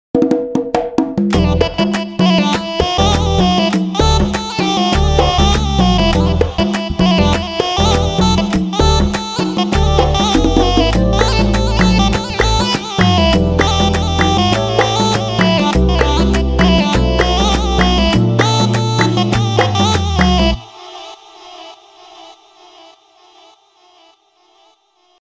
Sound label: conga